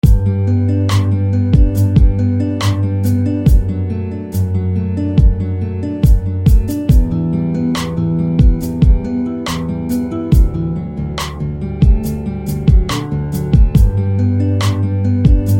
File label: Nylon; Nylon Freedom; SFB FX